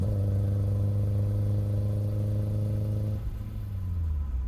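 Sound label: engine low loop; Blind Drive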